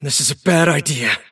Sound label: move pain; davion; dota